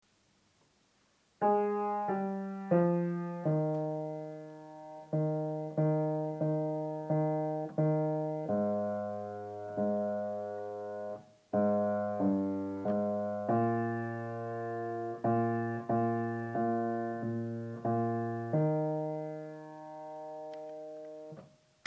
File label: bass